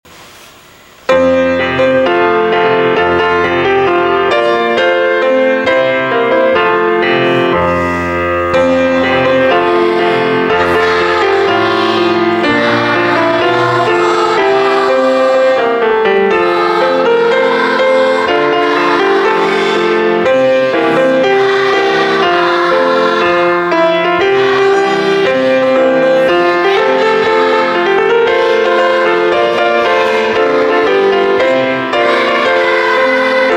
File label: piano